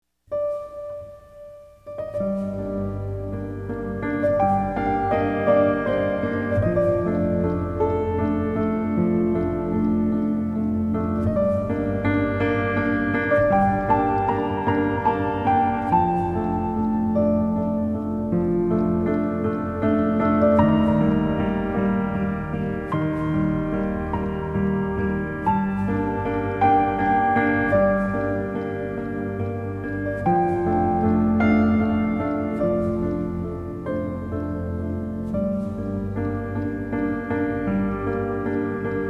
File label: Gentle Rain, Relaxing Piano Lullabies